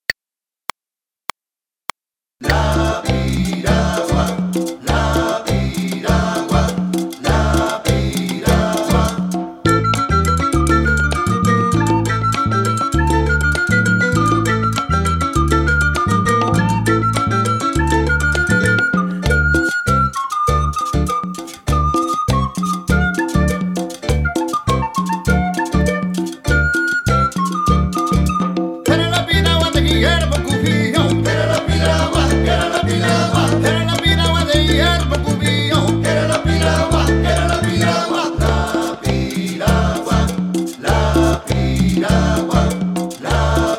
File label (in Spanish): Primera voz Flauta